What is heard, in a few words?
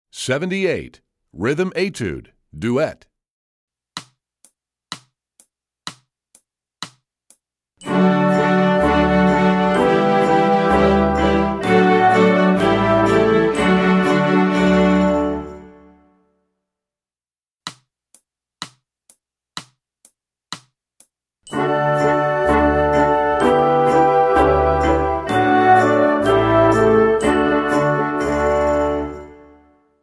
Rhythm Etude Duet, Strings; EE2000 Book2